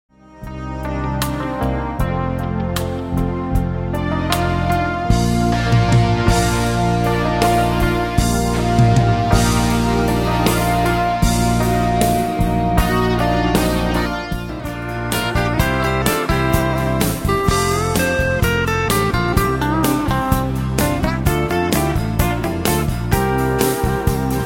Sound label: collage rock dinnermusik